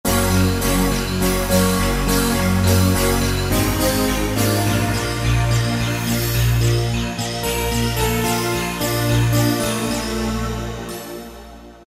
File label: Digital Sound; Roland PN-D50-01